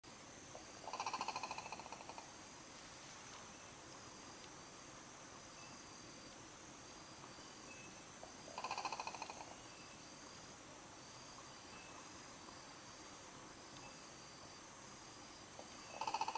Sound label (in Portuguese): O canto do Coró coró